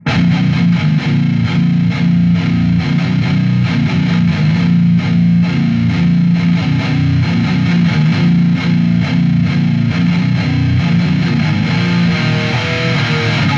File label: METAL